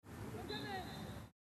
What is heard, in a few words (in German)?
Fussball Pfiff